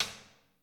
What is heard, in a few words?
Prac Perc